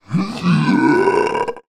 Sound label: horror monster zombie male groan; sound effects one